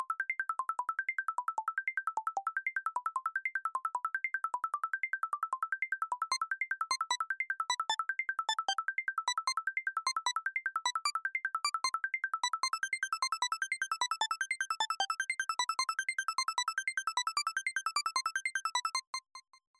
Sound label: Nano Ringtone; iOS Sounds